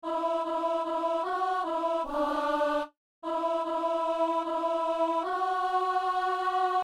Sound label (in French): psaume, alto